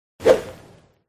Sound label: dodge; listvennik